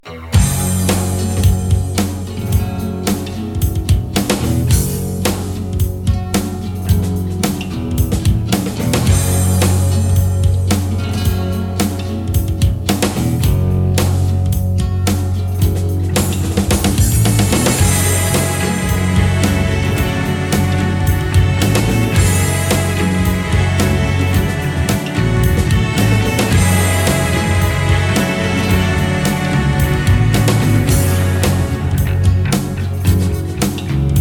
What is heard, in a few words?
Action; Spells of Gold